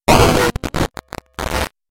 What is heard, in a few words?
game glitch